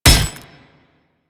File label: Ghoul Devourer Melee Impact; Warframe; Grineer Ghouls